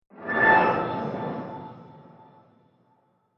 Whoosh Transition Sci Fi Metallic, Whoosh Transition Metallic Airy Magical; Ethereal Mystical; Whooshes; Sound Design